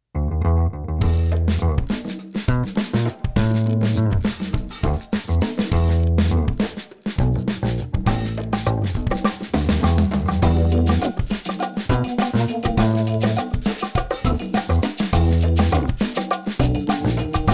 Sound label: Afro Cuban Funk